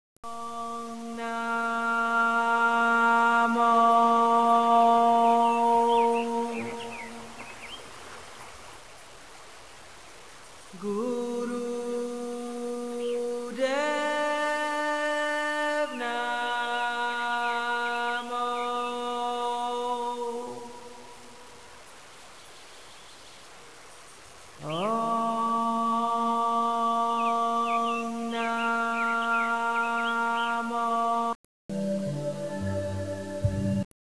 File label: chant